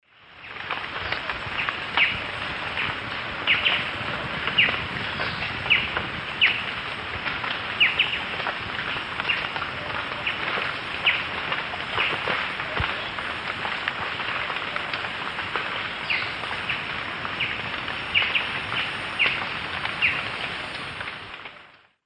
Herpsilochmus gentryi, calls, S. America Eastern Lowlands